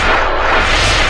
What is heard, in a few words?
force push